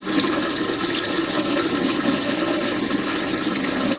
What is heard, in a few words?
sink